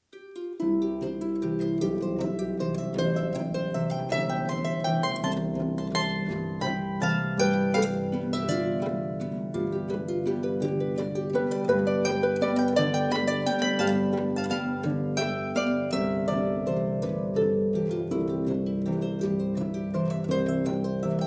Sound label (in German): Harfen landler